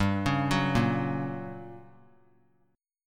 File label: G Minor Major 7th Flat 5th, G, down, 3C 3F 3A 3D; arpeggiated; JGuitar